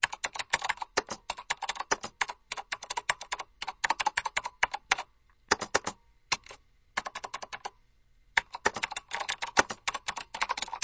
Tieng ban phim may tinh o van phong lam viec

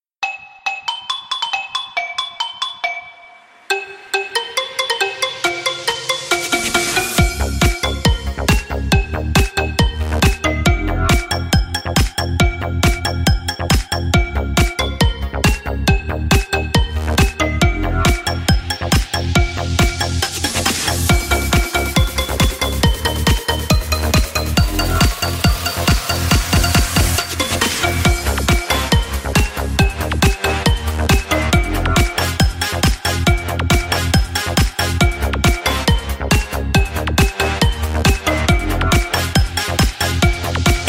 Nhạc chuông zalo remix

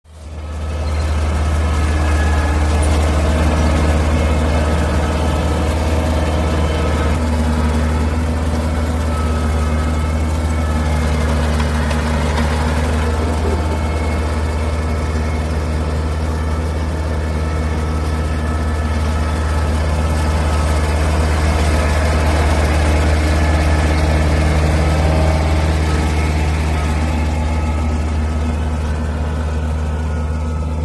tieng may gat lua lien hoan lien hop tren dong

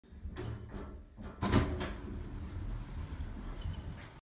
tieng thang may mo cua

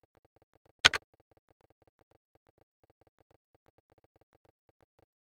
Tieng nhan nut enter may tinh

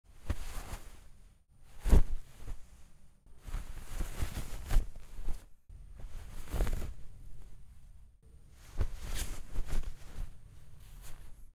tieng vai keu

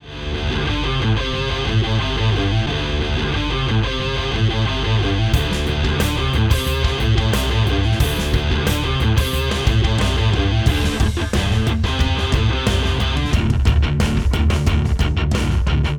Nhac nen nhac rock ngan mo dau video

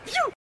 tieng chiu

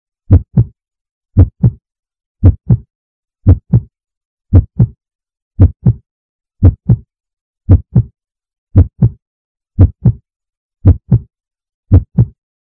Tieng nhip tim dap manh

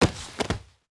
Tieng roi tu tren cao xuong sich

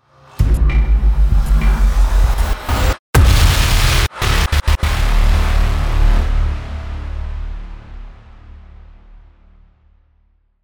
Tieng thien thach ha canh

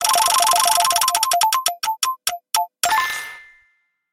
am thanh tro choi quay banh xe may man

